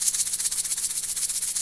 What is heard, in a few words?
PRC ET SHA, Shakers; Roland - Rhythm Section; Latin 2